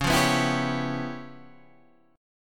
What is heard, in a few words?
C sharp 7th Sharp 9th Flat 5th C sharp Guitar Standard; strummed; 9,8,9,9,8,9